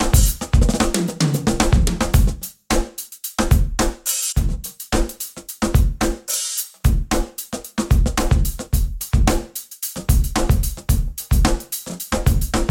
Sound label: tr7 bass